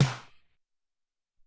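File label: Hop Snare, Alesis SR-18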